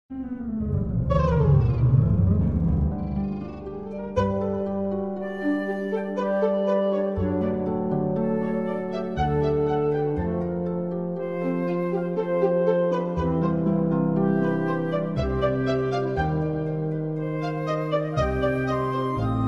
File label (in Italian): fl cl arpa trio archi